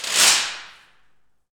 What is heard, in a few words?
Aluminum Wall, FX Perc; Roland L-CD701